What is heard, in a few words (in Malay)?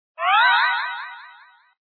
resep salah